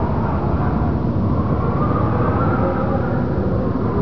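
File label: HURRICANE; THEREALM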